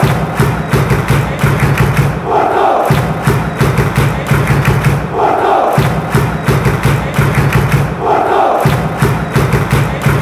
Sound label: claps, chants; porto; pfc